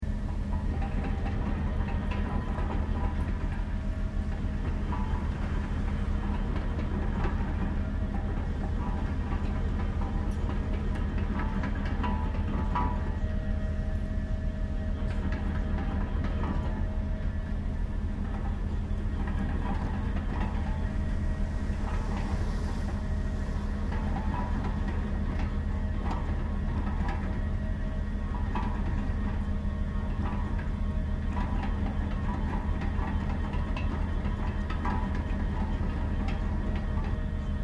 Construction Site Drilling Rig Operating Distant Loop, Japanese construction drill site